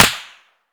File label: sonic impact shotgun; Escape from Tarkov